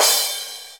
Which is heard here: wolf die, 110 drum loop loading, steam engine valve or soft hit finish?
soft hit finish